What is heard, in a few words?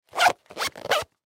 Zipper Pouch Closed Cloth; Zippers; Objects; Blastwave; Foley